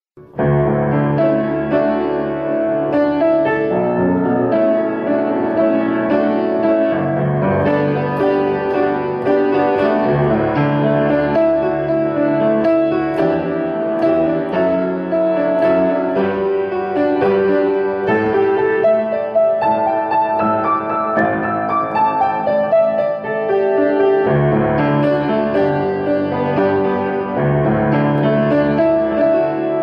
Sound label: Piano improvisation